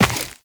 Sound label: Run Dirt, Footsteps; Warframe; Tenno